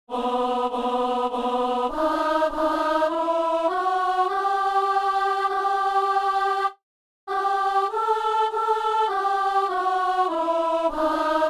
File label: alto